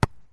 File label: bounce; Tower Crash